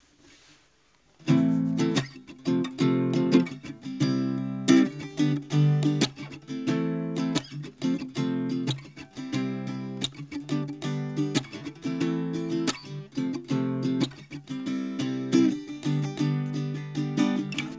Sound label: Yamaha Junior Guitar Strumming